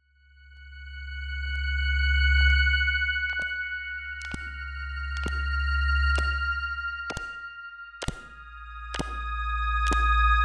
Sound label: bright vs dark pad